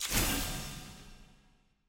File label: diamond burst